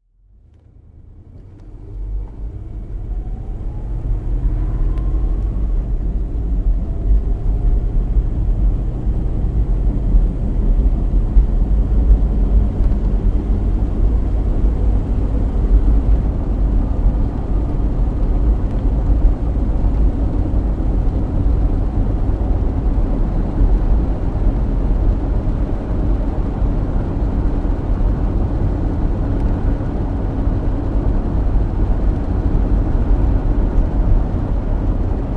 Wagoneer: Normal Driving, From Interior Perspective, Jeep Wagoneer